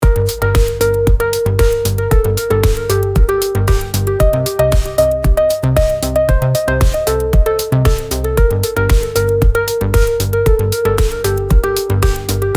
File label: 115 bpm Synth Pop Hop Rhythm Sample; 25 sec; ai social media music